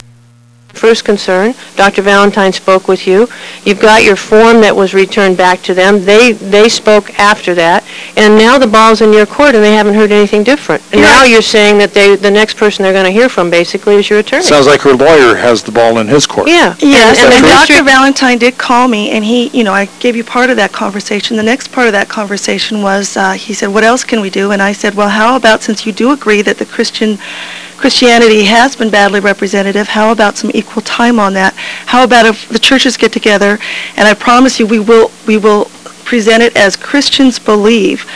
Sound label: laughed